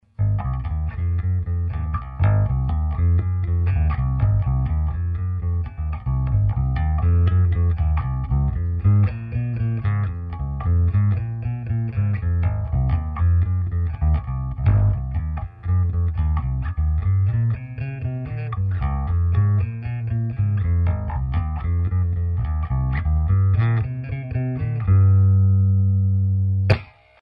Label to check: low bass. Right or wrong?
Right